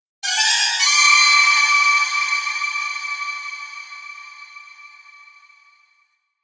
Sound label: Dramatic Violin Stab Long Decay; Timbre